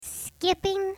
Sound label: skipping